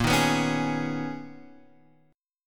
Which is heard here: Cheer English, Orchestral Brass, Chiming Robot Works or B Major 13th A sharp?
B Major 13th A sharp